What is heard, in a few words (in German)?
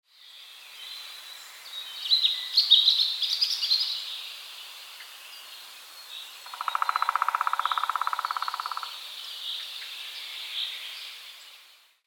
Morgenstimmung, Wald; erlebnis wald